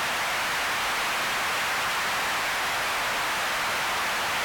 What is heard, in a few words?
Teleport Static Off; Viewfinder